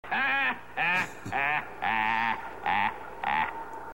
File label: laugh; MST3K; Prince of Space; krankor